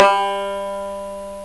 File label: banjo